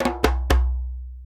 Conga Licks; Roland LCDP14 Africa VOL-2; BTS Conga Beats

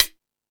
House Machine, Drum Machines

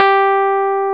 PIA, E-PIANOS MKS-20; Sound & Vision; Gigapack I CD 2

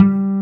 W BASS G2, Bass Guitar; AKAI S6000 CD-ROM - Volume 1

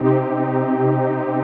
CHRD PAD; Best Service; XX Large Pads; Sample CDs

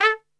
A 2 X PIC SHT, Brass; Best Service; Brass Super Section

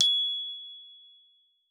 G#5, GLOCKENSP S; Xsample VOL-4

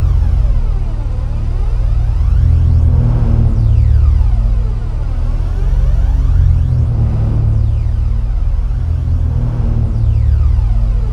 C1, Synth Leads

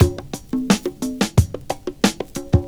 PERCS, 70 s Breakbeats

